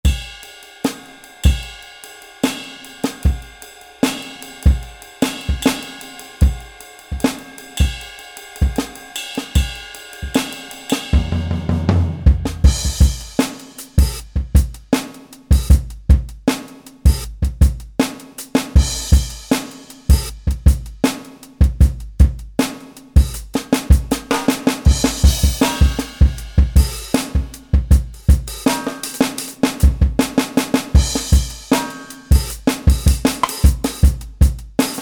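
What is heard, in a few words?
70 Jazz Funk KIT, Roland SRX-01 Dynamic Drum Kits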